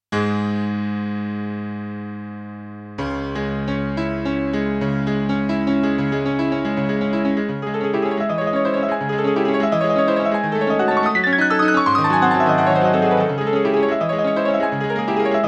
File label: SK-88Pro, piano